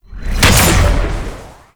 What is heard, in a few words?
Laser Wall Start; Enemies; Weapons; Warframe; Tau; Sentient Dactolyst